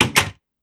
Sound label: close door; LockChime; minecraft